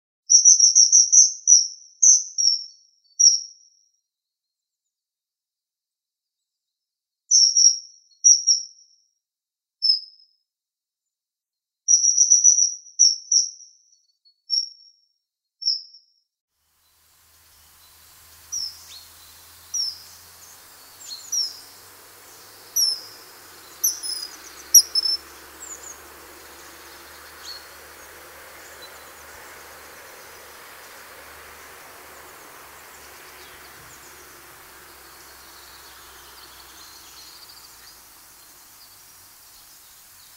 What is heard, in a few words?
Kingfisher Sound